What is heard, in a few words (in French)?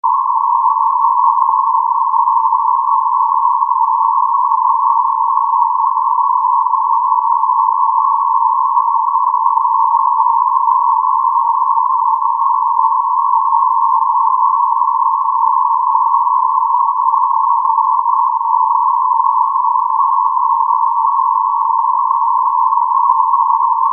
bruit rose